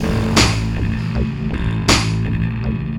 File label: FX, Futurist Drum & Bass